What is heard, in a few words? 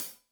Hat Tight, Cymbals Stereo Hi-Hat; Hat Tight 1; Deeply Sampled Drums